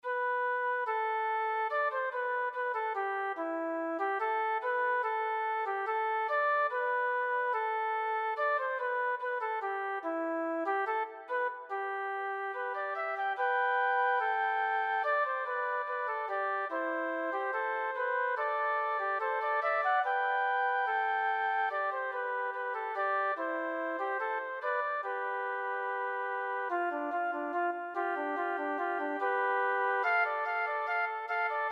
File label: flute duo anthem